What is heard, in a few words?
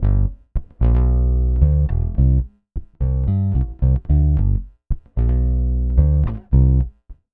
Pop, Bass